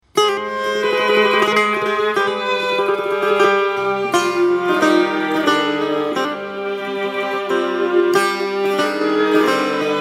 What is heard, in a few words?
Sound Track